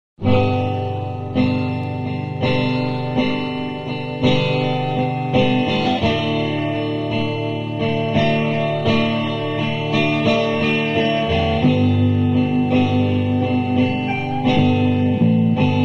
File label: Summer Rain